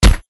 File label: Skill Effect; MilkChoco